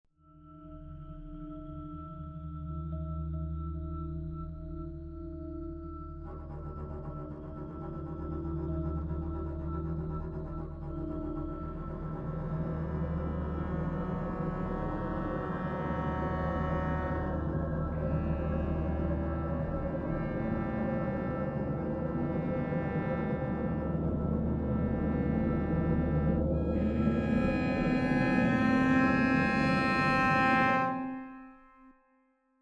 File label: atmo